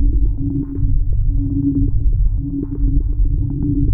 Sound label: ringworld hum idle; ambient; Stellaris